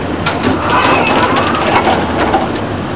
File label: doors